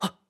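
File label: Climb, voice; BOTW; Breath of the Wild; Link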